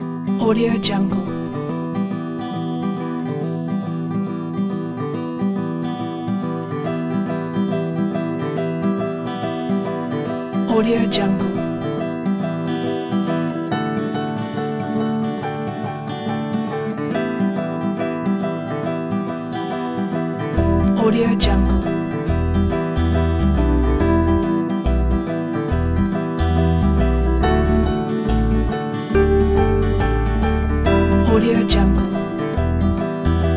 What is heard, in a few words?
acoustic suspense